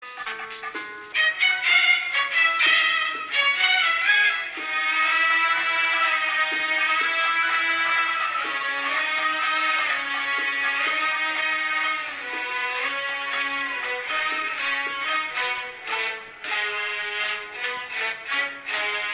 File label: Violin